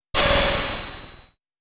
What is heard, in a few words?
Coin; Taito Digital Sound Archives